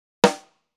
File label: snare